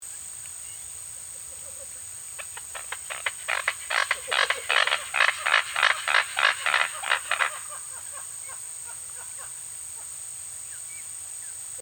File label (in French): foulque d'amerique